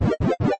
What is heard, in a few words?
pickup; nickelodeon basketball stars 2015